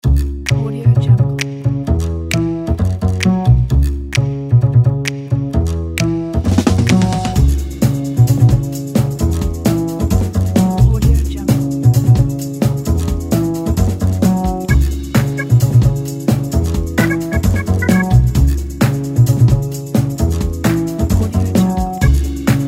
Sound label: jazz funk